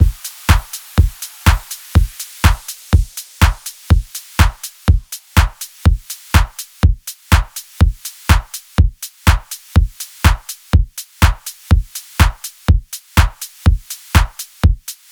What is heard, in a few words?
DRUM